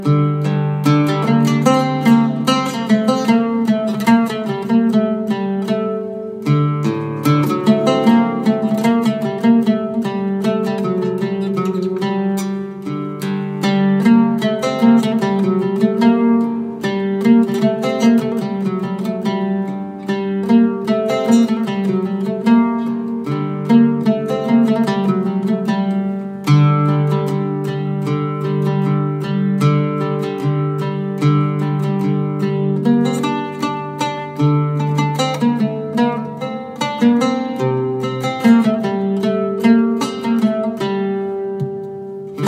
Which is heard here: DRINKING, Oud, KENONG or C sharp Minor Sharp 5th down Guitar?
Oud